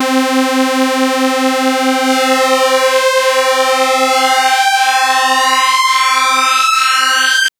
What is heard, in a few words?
Synth Sync Sweep Pad c3